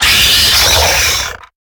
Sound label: Stealth Attack; Lurker; Evolve; Gorgon